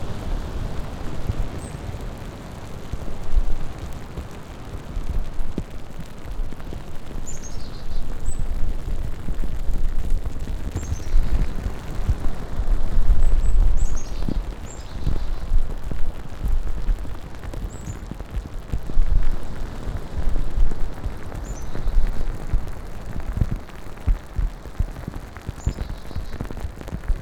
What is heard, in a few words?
sabal palm sea foam fizzle